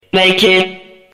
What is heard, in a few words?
vault hit stinger; sinners